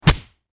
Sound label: Impact Punch Face Fist Cuff; Punches and Hits; Combat